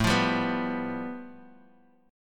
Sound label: A 7th Suspended 2nd Sharp 5th